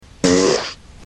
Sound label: hushed foul fart